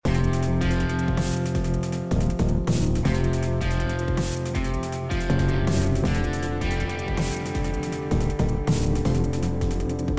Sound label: music (Combat); unsighted; Aceso Combat